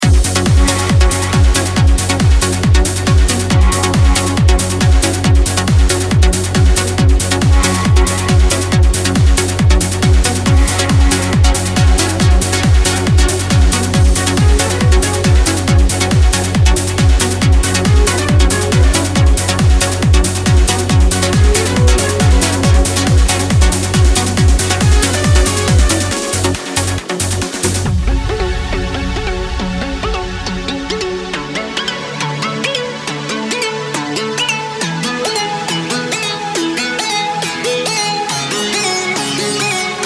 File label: trance collection